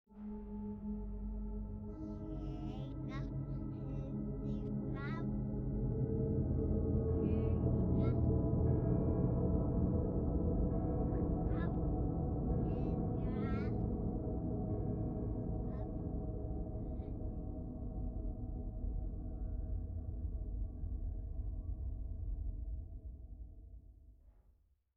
shock relief; Joe's Diner

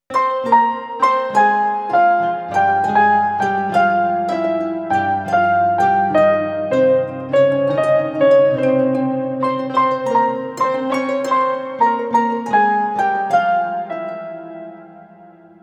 Leitmotif Instrumental; saturnalia original soundtrack 2022

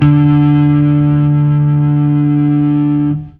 Fuzz D harm

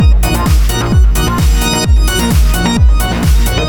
Electro

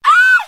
intro scream; talking tom gold run gamerip 2016; Becca

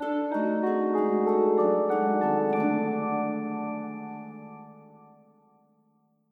Sleep jingle

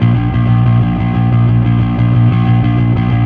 Fuzz A sharp 5; torchlight iii; ps4

